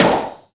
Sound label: SD Dance; Sound & Vision - Gigapack I